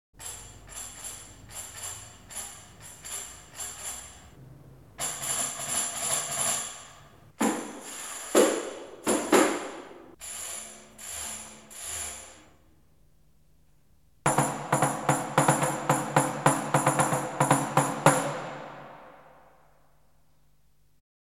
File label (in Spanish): Sonido de pandereta; Instrumentos de percusion; Percusion indeterminada; membrana